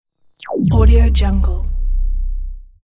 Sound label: deep laser transition